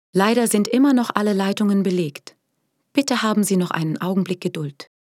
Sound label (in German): telefonpaket warteschleife